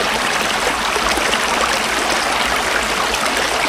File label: stream; source engine; left4dead2